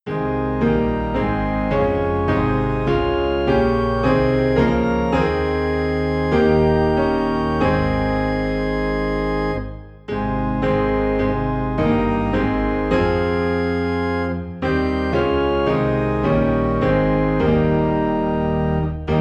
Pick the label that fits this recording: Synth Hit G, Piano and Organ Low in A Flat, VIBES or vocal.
Piano and Organ Low in A Flat